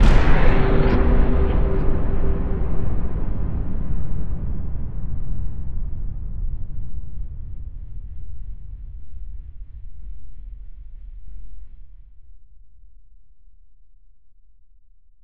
STING Insect Bomb; Cinematic Metal Impacts

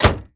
Truck Explorer Hood Close; Trucks

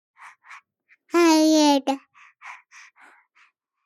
BABIES TALK Boy 3 months; Baby Boom

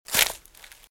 Footsteps Leaves Walk Right; RadwinIsland

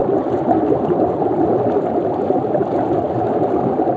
Water Enemy Attribute Loop; AudioWizards

